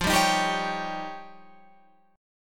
F 7th Suspended 2nd Sharp 5th, Guitar Open F; strummed; chord; F7sus2#5; 17,14,15,14,16,14; JGuitar

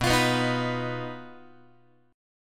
B-Major Flat 5th B down Guitar; strummed; x,7,8,9,7,10; 4A,4E,5A,5D,5G#,6C#; JGuitar

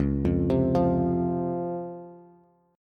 Db Major 7th Db down Bass; arpeggiated; JGuitar

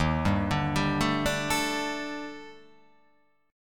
D sharp Major 7th Flat 5th, down, chord

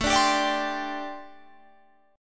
G Suspended 2nd Suspended 4th

